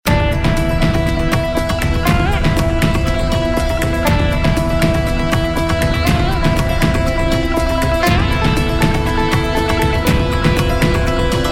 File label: Anthem, Instrumental; Bollywood; Jal